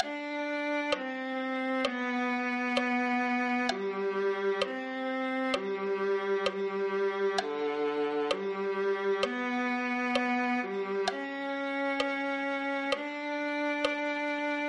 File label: viola